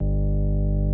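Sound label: CX3 overdrive, organs; Greytsounds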